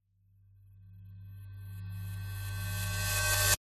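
sound design cymbal reverse crescendo suspense climax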